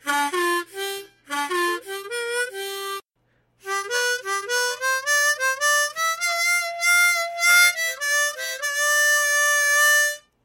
Harmonica

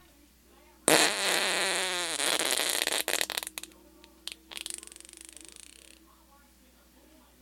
Farting Noises

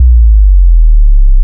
Jungle Bass Slide

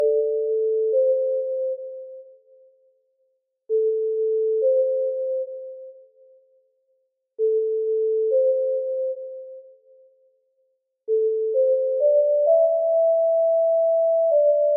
Indian Flute 130bpm

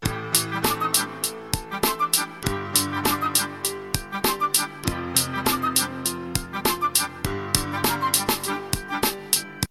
Movie Scene Hip Hop

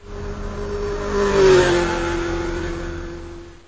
Motorcycle

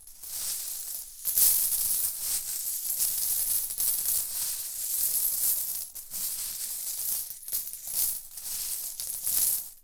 Money and Coins

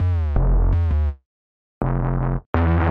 Jungle Bass 165bpm

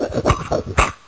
Didgeridoo Sounds

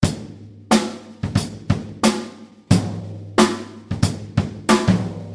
Jazzy Breaks

